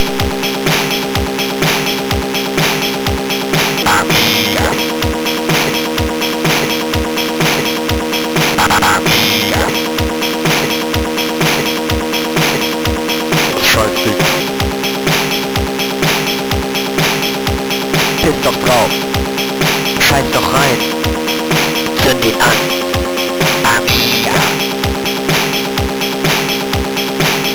Amiga demo tune, MOS6581R2; Amiga Dead Demo

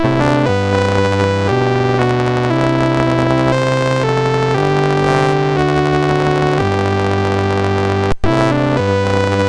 BASIC sid MOS6581R4

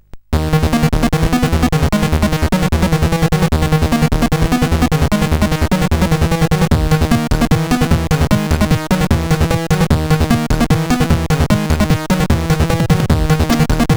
PAL, sid CSG8580R5

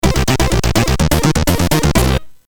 Jingles, CSG8580; CSG8580R5; hvsc

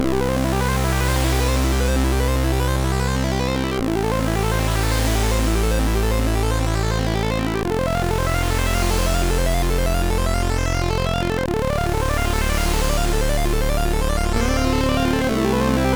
Sad End, sid MOS6581R3

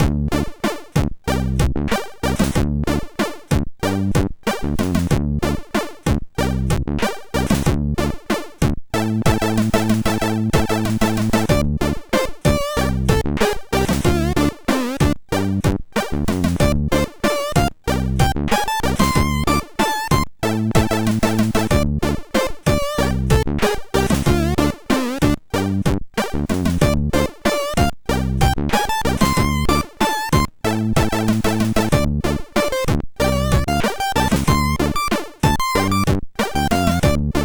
Metal, MOS6581; MOS6581R4

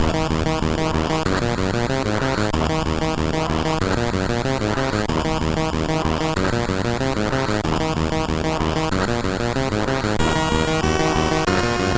Malaria sid MOS6581R4

